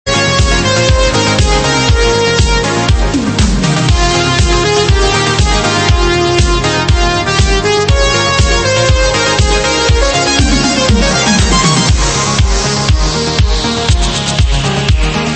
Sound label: synthwave remix; R-type